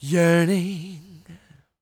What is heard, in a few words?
croon, Vocal Planet Blues Soul; Spectrasonics